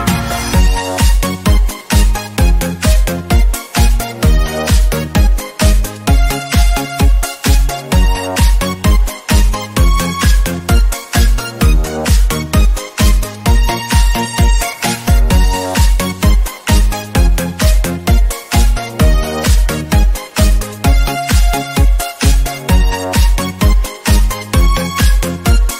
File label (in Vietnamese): Remix Không Lời